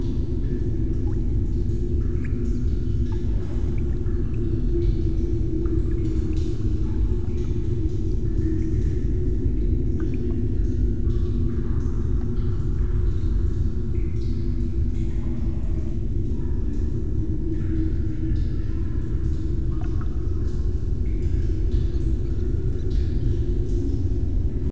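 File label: ambience spring cave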